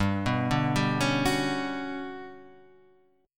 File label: G Major Sharp 11th G down, chord; arpeggiated; Standard; JGuitar